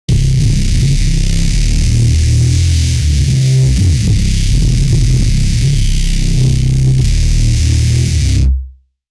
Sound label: heavy neurofunk bass; music